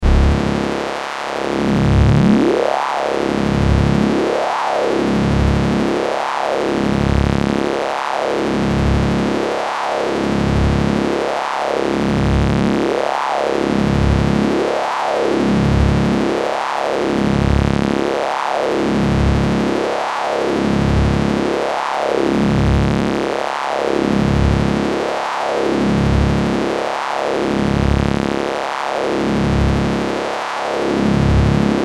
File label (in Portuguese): som de eletricidade